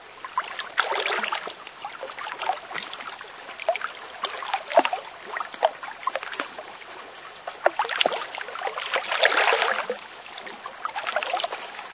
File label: water gently lapping, nature; ambient; Source Engine; cstrike